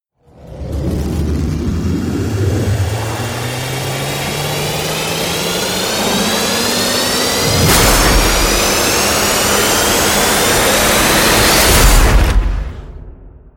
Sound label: rise long swarm